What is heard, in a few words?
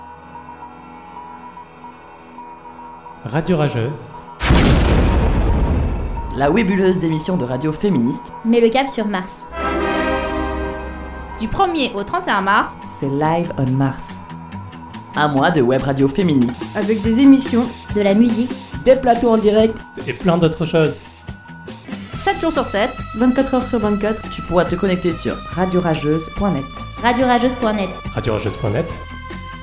jingle